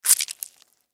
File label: Squish Juicy Squeeze; Squishes; Foley